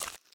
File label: Apple Eat; Game rip; MiSide